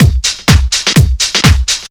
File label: drum loop 125, claptomania; house; PS-28 Vocal House Samples; drumloops